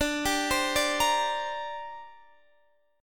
D 7th Suspended 4th Sharp 5th, down, Guitar, DADGAD